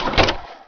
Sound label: rocket trooper reload; Emperor - Battle for Dune